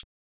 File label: hi hat; maily plugnb; fl studio; drumkits